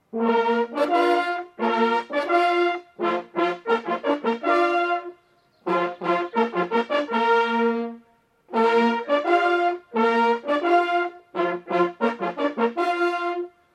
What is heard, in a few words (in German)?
Jagdhornbläser Hunderuf; Holthausen